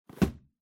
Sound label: Cardboard Box (Put Down); ダンボール; 置く; 梱包材